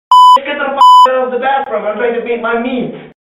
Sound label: bathroom